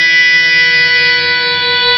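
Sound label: feedback E3, El. Guitars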